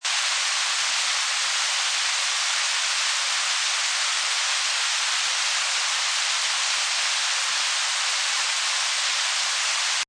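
Weather Rain Fall On Water Loop, A medium-heavy rainfall. Seamless loop.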